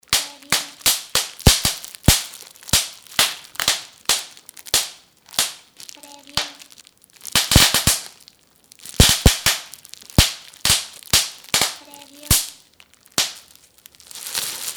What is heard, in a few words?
Bubble Wrap Popping